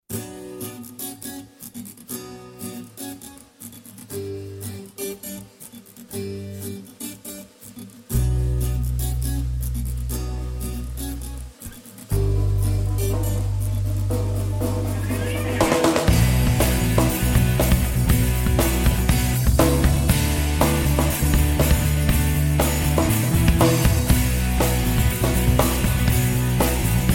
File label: gitar tone